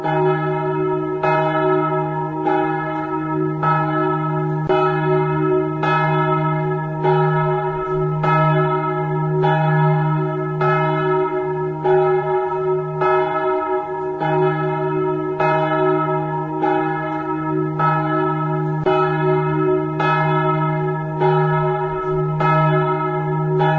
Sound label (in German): Gebetsglocke es1